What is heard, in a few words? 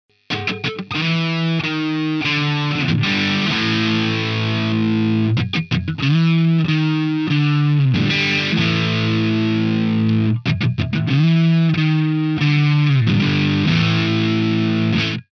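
overdrive YAMAHA SA2000S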